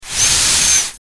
Helium Tank; Release 1; Quick Helium Gas Release; Close Perspective. Pressurized Gas.